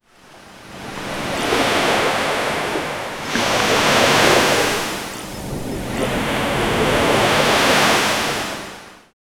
ocean waves crashing on, shore

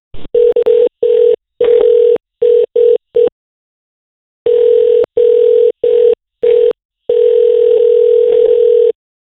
app calling sound; ringtone

